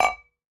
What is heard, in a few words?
Ball Collision Holiday Mug Soft